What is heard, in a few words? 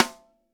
short reverb snare sample a sharp key